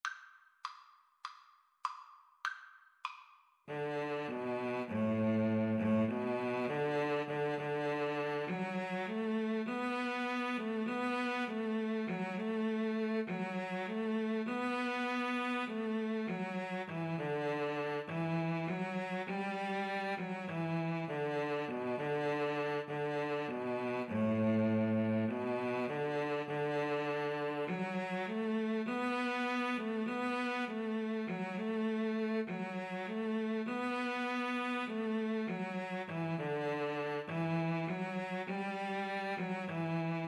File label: Cello Duet